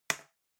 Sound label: Trackpad Click Far L Hard